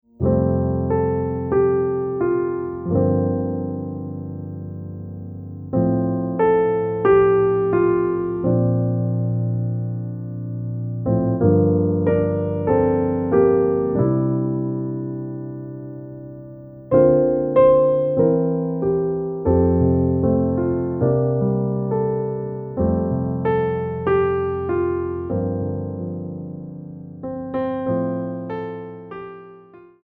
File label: jingle bells